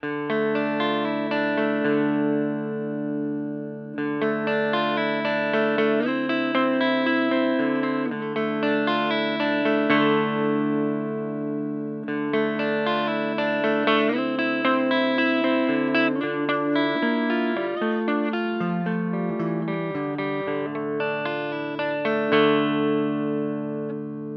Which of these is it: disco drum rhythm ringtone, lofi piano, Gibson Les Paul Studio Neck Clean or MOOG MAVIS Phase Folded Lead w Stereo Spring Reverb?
Gibson Les Paul Studio Neck Clean